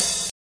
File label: hat; Hats